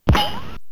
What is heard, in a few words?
recoil; singe; WSJR HD